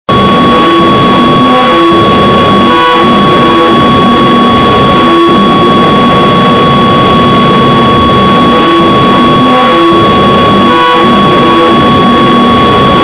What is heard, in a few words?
xylowave